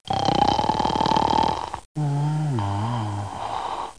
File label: Snore; JONAH